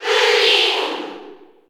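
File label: Cheer Japanese; Purin; SSB4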